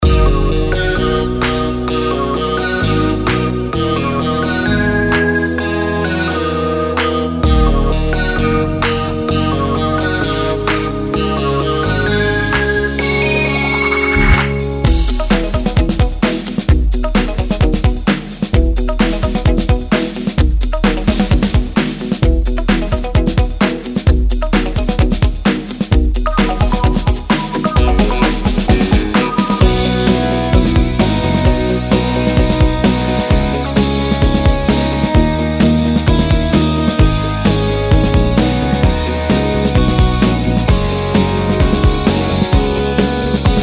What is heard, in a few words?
ENGLISH POPULAR SONG